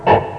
noise

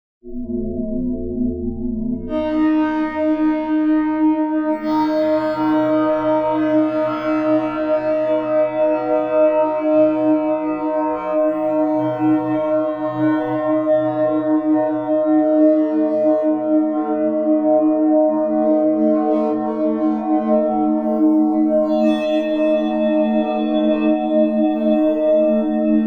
fuzz gong no noise; micro; unknown tunings

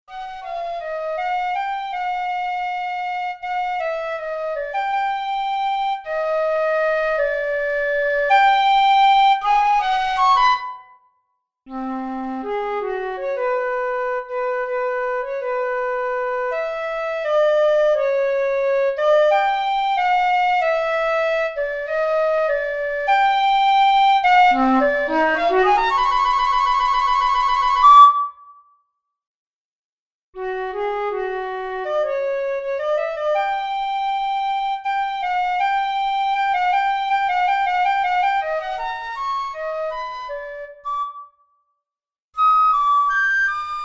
flute solo; micro; flute-compare-tunings

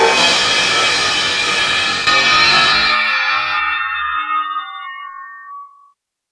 cym, drums

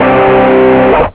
c9th dist, guitar chords

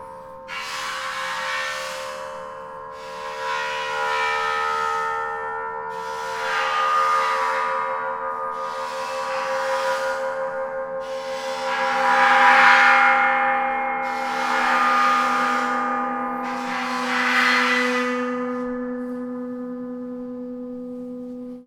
bowed cymbals; metallic stuff samples

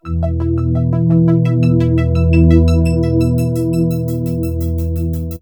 stairs, korg

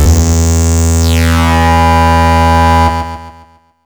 bass

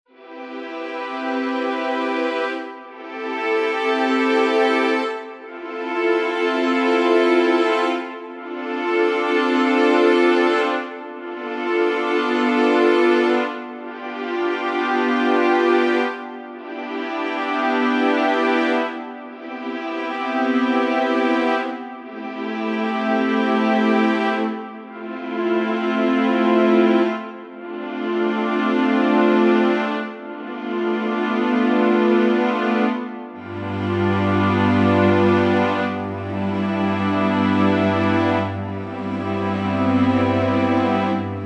string progression

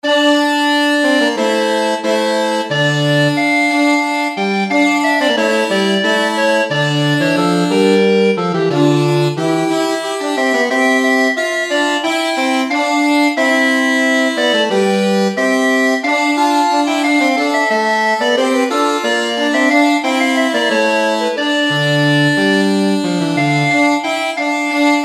alto recorder diplica folk shawm; quarter-comma meantone; O Venus Bant

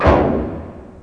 tom3, drums

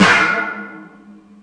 can; drums

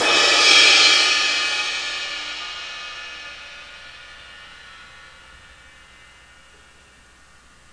cym, drums

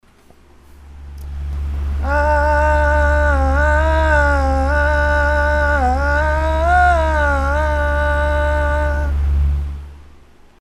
vocal thru a fan

